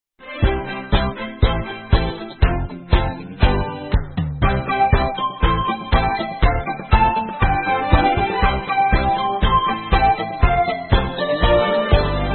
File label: melody